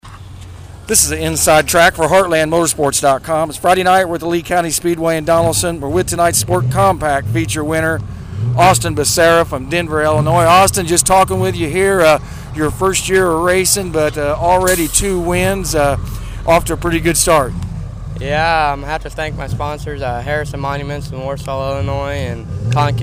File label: IMCA Sport Compact; Lee County